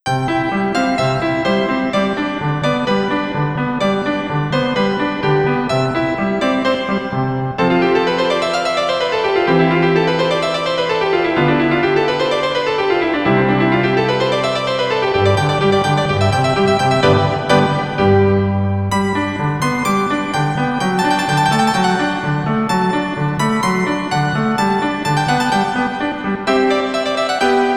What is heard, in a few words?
Piano Lesson